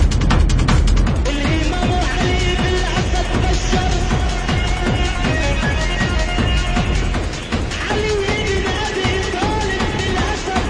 arabic techno

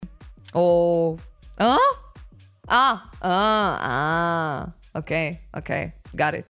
ahaa; foxy

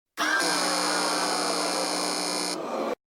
incorrect

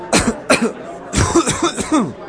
coughing

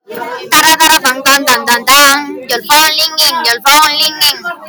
your phone ringing

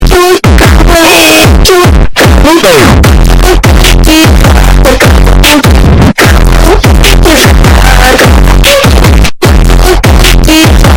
bass booster

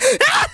usb jumpscare

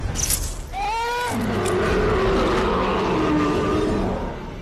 roar; city hunter predator heal

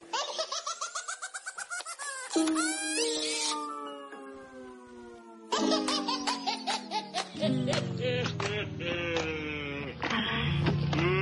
fun baby laugh

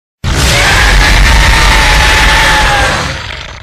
diablos roar; mhw

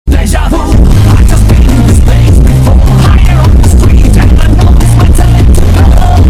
bass boost

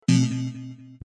golf fail; wii sports

